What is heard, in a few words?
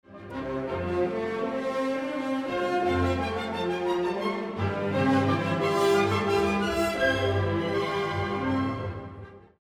A chorale, played by the woodwinds and the horns; combined with the short notes motive; Schumann symph3; Movement n.2 - excerpt n.3